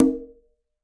Conga A Open; Latin Percussion; Drums; Drum Hits; Ableton; Factory Packs

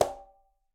Quinto C Slap Open, Conga, Latin Percussion; Drums; Drum Hits; Ableton; Factory Packs

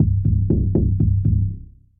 Particle Bass Pulse; Ableton; Mood Reel; Tense Pulses

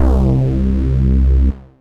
Analog Buzz Bass; Ableton; Factory Packs; Synth Essentials

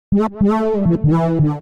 A Minor 84 bpm; MIDI Clips; Melodic; Hyper Dee; Ableton; Factory Packs; Chop and Swing

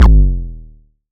modular tonal, kick; Ableton Foundation FreePacks

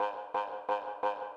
175 D&B Synth Gm; Dance DJ

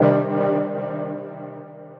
Transistor, Synth Keys

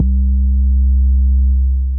Bending Boom; Bass; Ableton; Factory Packs; Chop and Swing

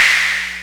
Clapbox Noise; Ableton Foundation FreePacks

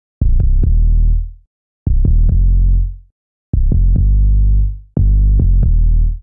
Bouncy (Cmin) 144bpm Bass Verse; Ableton; Factory Packs; Samplification

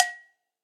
Cowbell Mid Old School; Drums; Drum Hits; Standard Hits; Ableton; Factory Packs; Session Drums Studio

